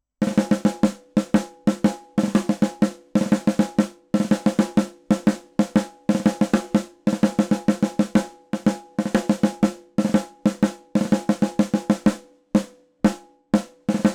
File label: drum corp snare